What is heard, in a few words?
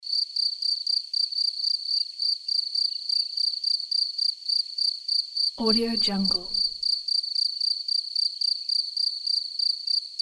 cicada; soundfood